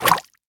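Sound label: attack bottle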